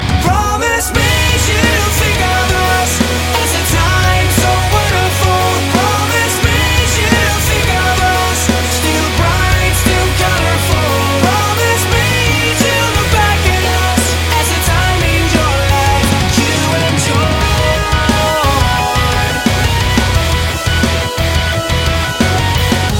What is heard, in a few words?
cool song